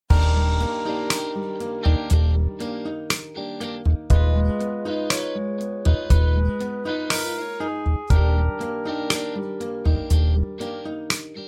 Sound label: Mixo Flat Six Groove